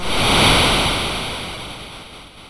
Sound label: sid MOS6581R2